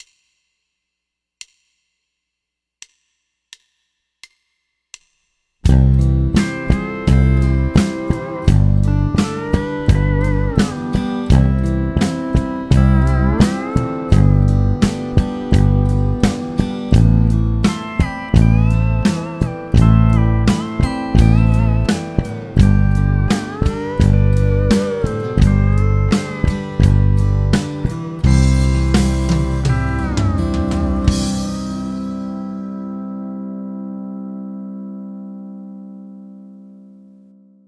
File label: Full Band, Ghost Notes; The Old Rockin Chair; 7-Day-Bass-Challenge